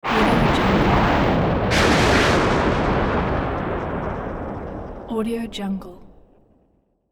energy collision; sound ideas

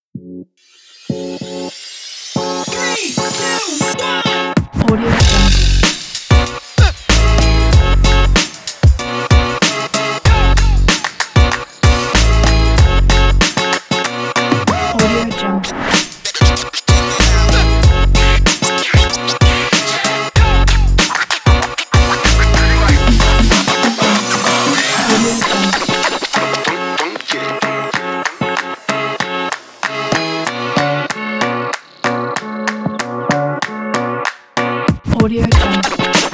hiphop

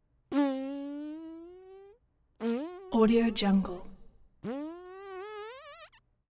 vocal suction human made slups sucks some buzzy

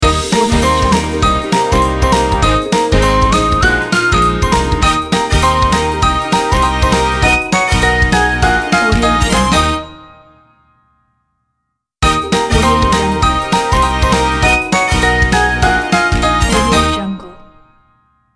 happy intro logo

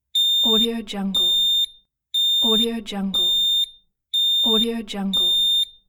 house alarm

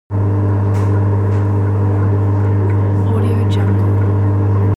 atmo room tone construction site control room

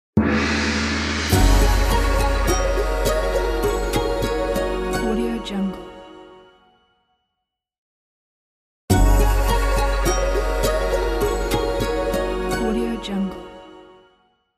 china logo

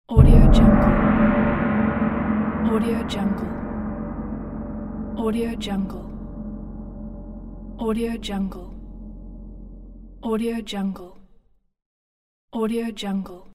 gong; sound forces

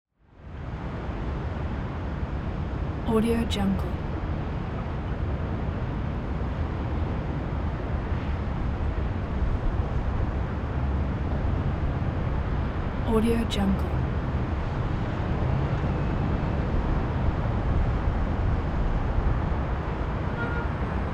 new york city off street light traffic

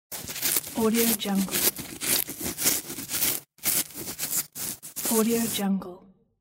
scratching beard

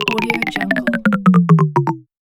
cartoon tape stop; fx market

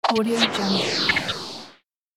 fly whooshes

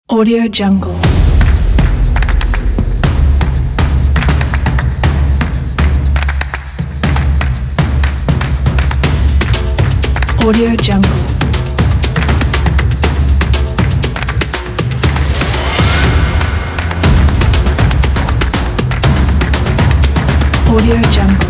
percussion pack

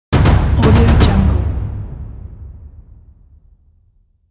deep drum impacts

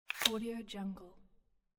card flip

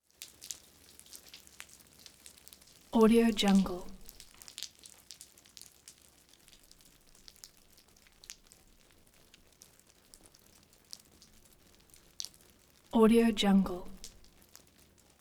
rain splatter; prosoundfx

